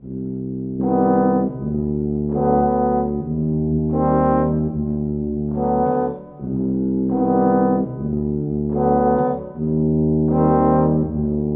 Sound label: Brass, Cinematic; Sonic Foundry (Sony Creative Software); Orchestral Series VOL-3 - Cinematic